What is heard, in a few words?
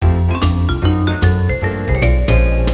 jazz; ジャズサウンドの一部